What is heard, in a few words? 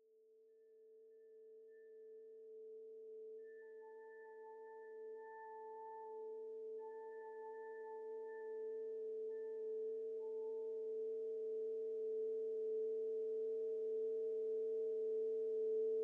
weird windchime pad